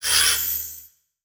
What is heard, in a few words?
Transition Metallic Hit; Production Element; Title Transition; AdobeCC